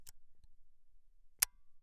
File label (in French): ARMEMENT-DECLENCHEMENT 1-300s